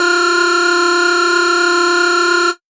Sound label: lead buzz F; leads